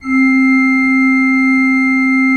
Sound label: C3, Cathedral Organ; ILIO; Propeller Islands Cathedral Organ